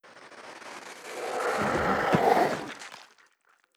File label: space amoeba death; Stellaris; other entities